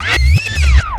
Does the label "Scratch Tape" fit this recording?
Yes